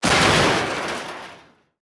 plane; World of Tanks

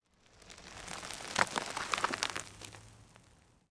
sand debris; ambient; World of Tanks Blitz

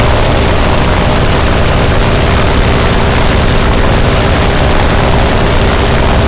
me109 side, engines; War Thunder

